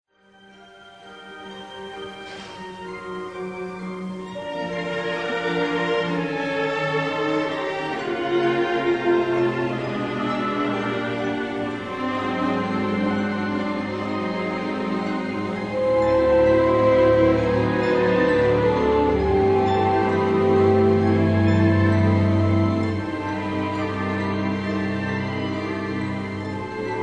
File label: Winter landscape, Orchestra; North Country Sketches